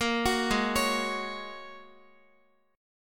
Ab 7th Suspended 2nd Suspended 4th, Guitar, 4F,4A,5C,5G,5C,6E; arpeggiated